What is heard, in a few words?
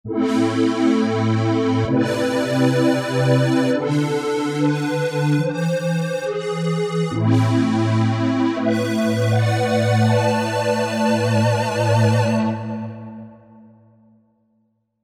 Square Pad, Korg MSC-12S Synth; Synth 3